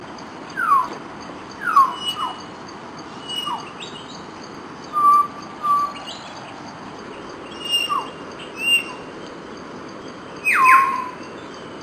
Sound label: Hill Myna Tiong Call more whistles; Amar